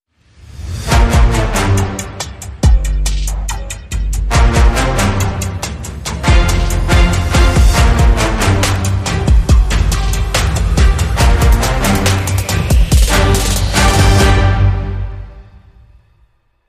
Hybrid Sting

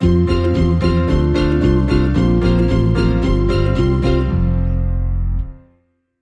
Stinger No Drums

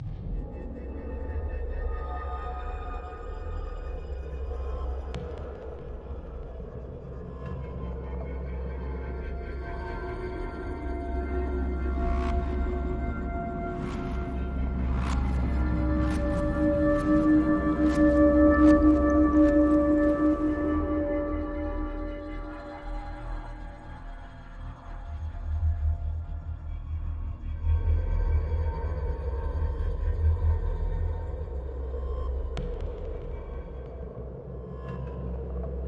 Drone

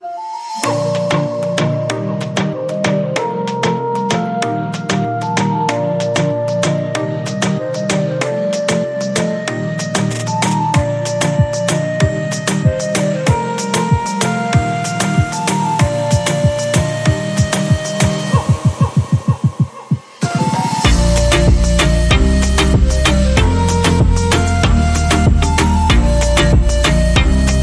Caribean Flute

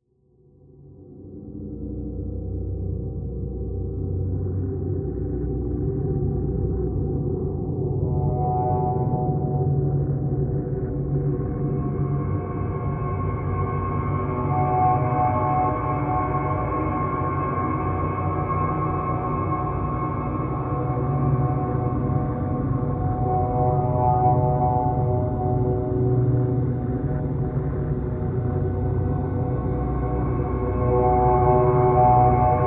Pads Only

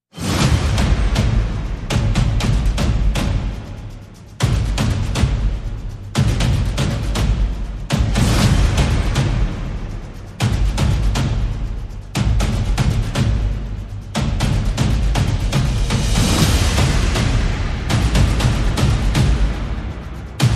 Percussion and FX

